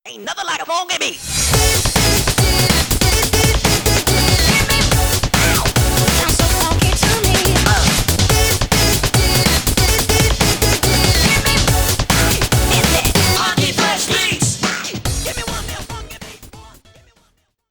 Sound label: funkybeat; tf